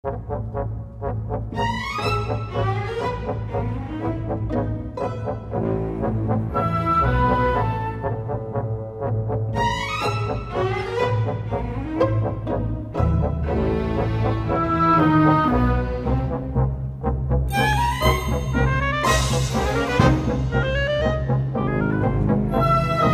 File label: orchestral; Acid 3.0